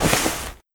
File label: Cloth; Scum